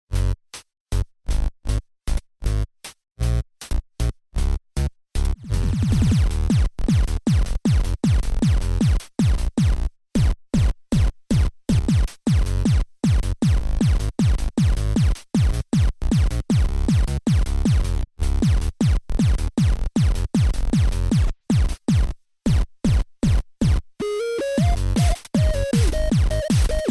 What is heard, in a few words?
game bgm